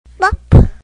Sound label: bump